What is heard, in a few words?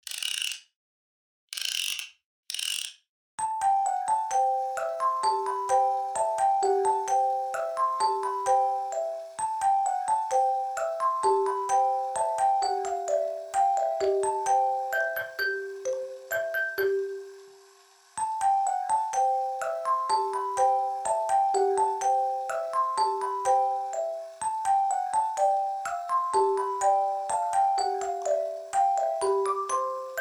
Old Music Box